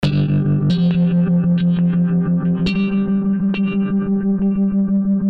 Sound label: a tremelo loop